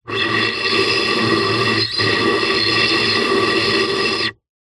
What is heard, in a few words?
foley air blow inside small tube pipe recorded at opening